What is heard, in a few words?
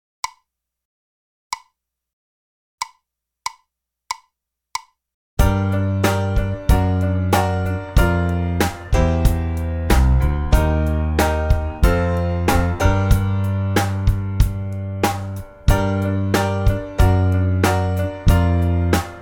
Minor with Descending Bass